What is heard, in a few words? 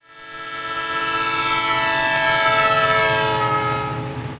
horn